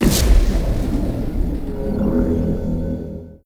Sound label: Explosion